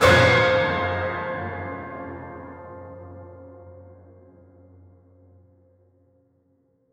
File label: impact